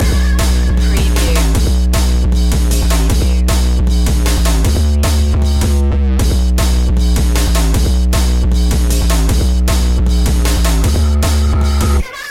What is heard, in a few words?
bass tricks loop